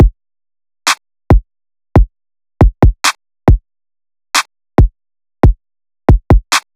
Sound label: Drums 138bpm drill dark